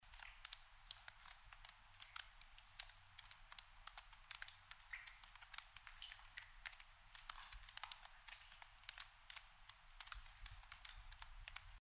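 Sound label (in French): gouttes simples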